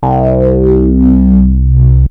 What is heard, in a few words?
rez dive, Chroma Polaris